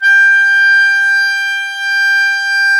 KEY ACRDN, Accord Trio; Club-50 - Foundations Roland; Accordians

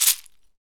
SHEKE, Afro Shakers, Shekeres

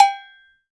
Cowbell Hi, Roland BK-5 Percussion

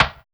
comp perc; Drums In Your FACE!

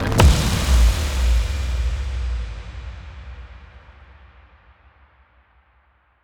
impact spiral

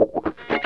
wah snip, Funk Gtr & Wah

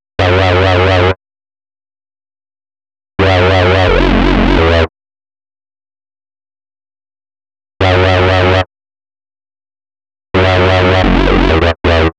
Wobble Lead; Sonic Foundry (Sony Creative Software); Afterhours EDM

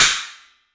CYMBALS; 3D Sound; 3D Drums

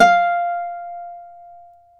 Celtic Harp, Plucked Strings

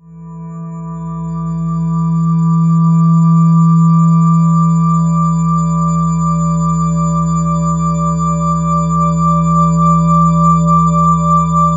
CHURCH, Ominous Drones; USB Soundscan vol.48; AKAI; MYSTIC

